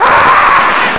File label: death; alienarena; doomunls